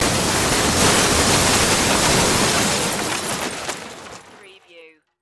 Crash Roll Large

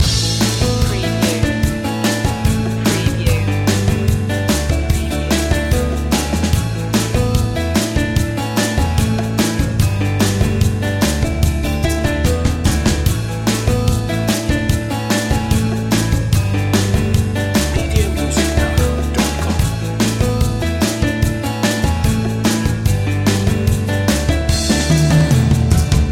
clear blue loop

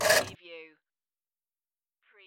Pinball sound, motor or crane move

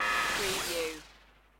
Space Cut

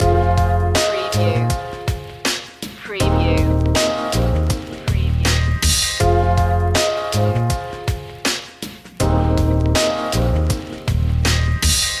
wavebreak loop

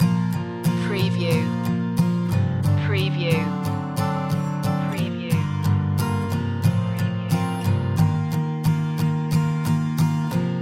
loop

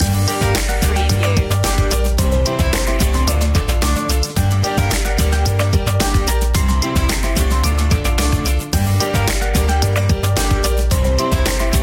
latin love loop end; media music now

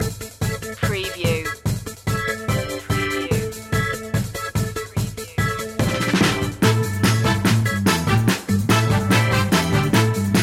give me one time no guitars

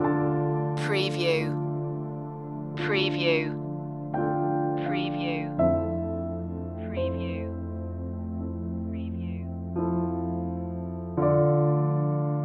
ambient singularity loop

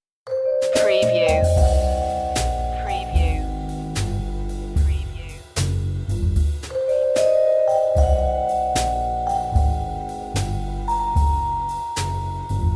lounge

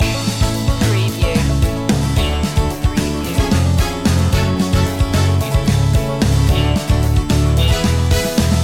power pop goes bang loop

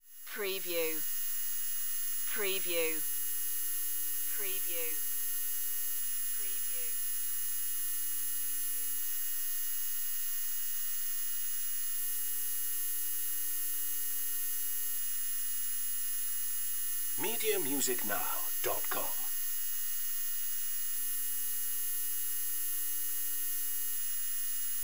Computer Screen High

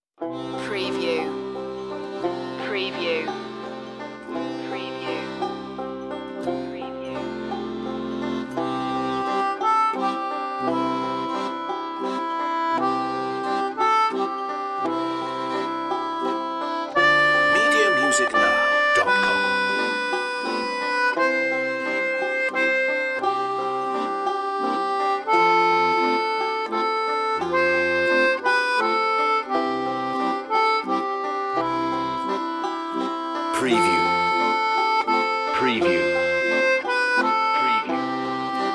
concertina banjo accordion; silent night

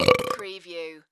Burp Male; sound-effects-bible

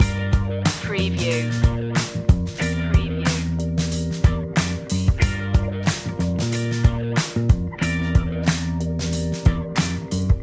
sweet groove loop; rhythm and blues

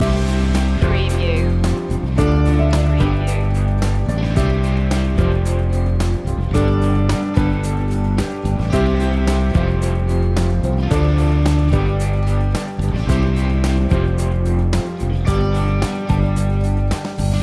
easy drive loop

